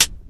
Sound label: thin pedal hi hat single hit f sharp key; hat samples